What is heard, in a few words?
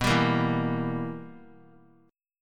B Major 7th Suspended 4th Sharp 5th, chord; BM7sus4#5; strummed; JGuitar